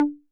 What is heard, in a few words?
Conga Mid 808 Saturated B